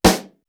WOOD SNARE; East West; Global Sounds

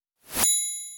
bad narrator fill triangle

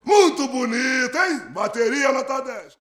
BRAZIL, World Voices; ILIO; Vocal Planet VOL-5; RIO MADMEN

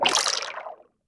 Drain; YAMAHA RM1X

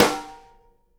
snare GLASS, Live Snares; E-MU Producer Series Vol. 8 – Platinum Phatt (CD 1)

